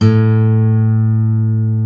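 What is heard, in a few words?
GUI Ac. A2, acoustic guitar; Best Service Gigapack 2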